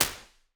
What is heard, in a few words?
Early Reflections Blue Room L; Impulse Responses; Hybrid; Ableton Live 12 Lite